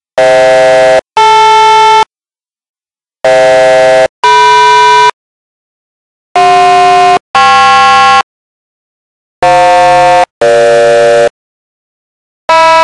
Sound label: quikcall tone 2